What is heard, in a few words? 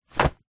household pillow case drop ground